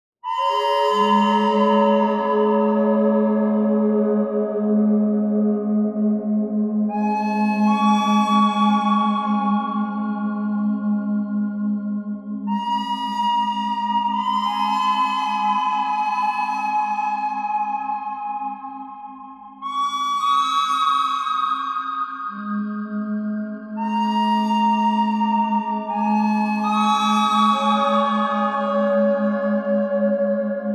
bird thread

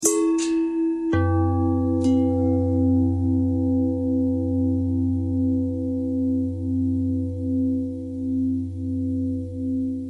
bell long bell thread pentatonic; bells

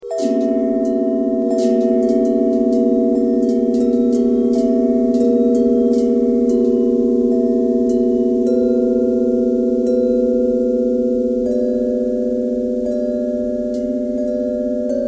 bell bendtwist

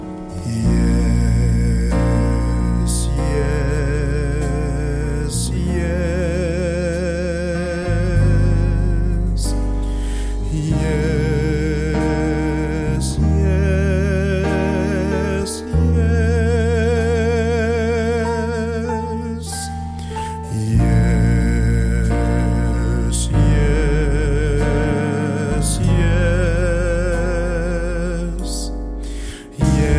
Yes, Yes, Yes, Gospel Vocalises

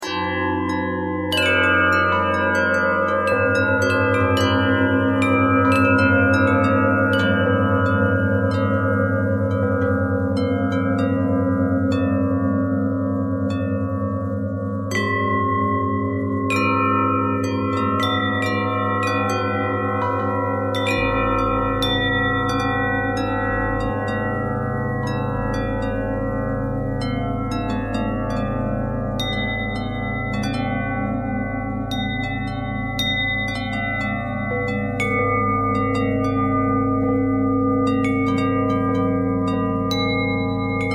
bell harmonic twist

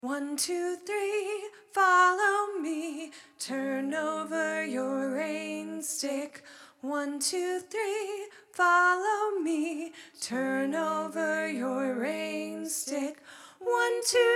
Rainstick

